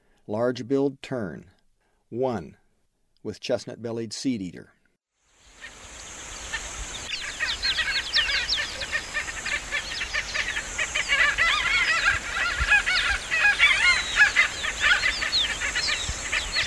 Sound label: Large-billed Tern; S. America; Eastern Lowlands